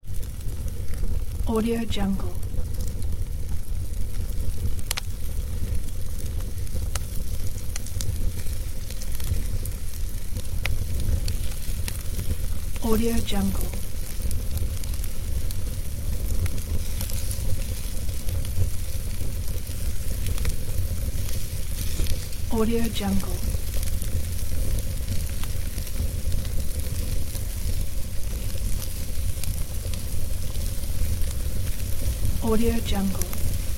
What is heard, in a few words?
fire crackling